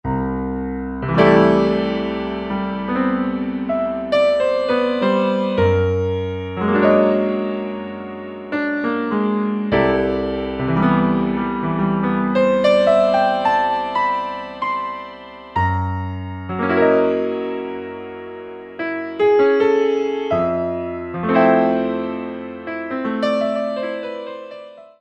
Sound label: Ac. Piano